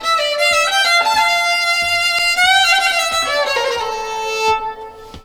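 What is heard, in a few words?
Balkanish Traditions strings; USB Soundscan vol.63; AKAI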